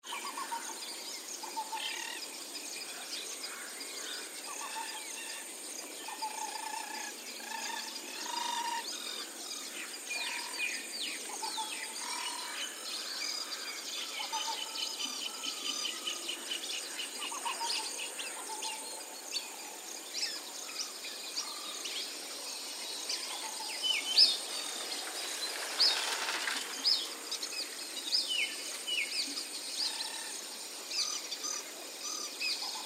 Debarawewa Tissa, nature sounds; Animals heard in the soundscapes

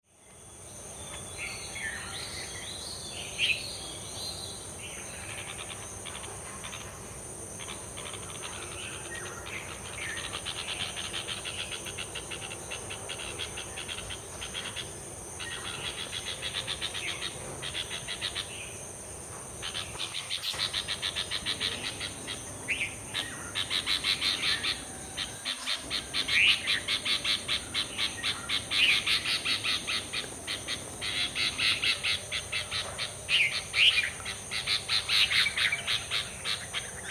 Bulbul; ZOOM

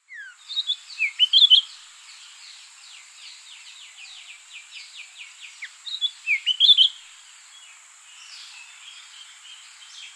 Jerdons Leafbird; Sri Lanka

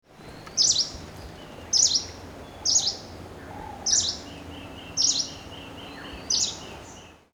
Green Warbler

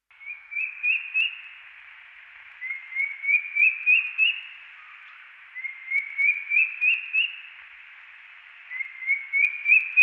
Drongo Cuckoo; Sri Lanka

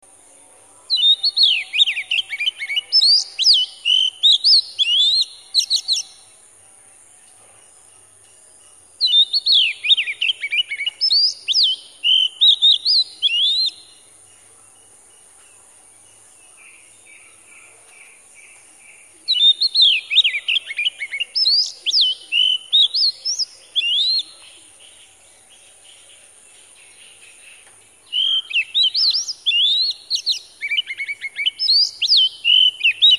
Oriental Magpie Robin; Sri Lanka

